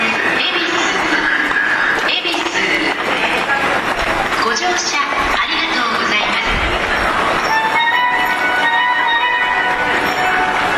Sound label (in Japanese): 恵比寿駅, 山手線; 地元商店街の要望で実現しました。; 新宿・池袋方面